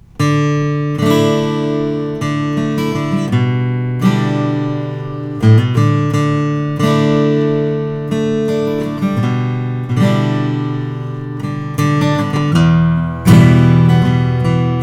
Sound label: froggy bottom p 12 deluxe rosewood